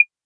clave one shot; Percs

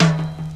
Closed Hats

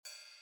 Open Hat INTRO

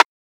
Rim

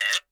Perc

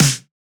snare 80s

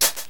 Percs

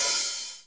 HIHAT

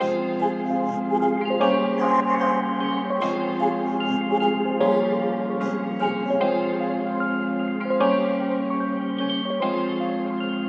dark melody

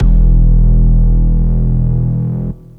Phat Synth; PhatSynth (95)

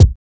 kicks

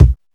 Kicks

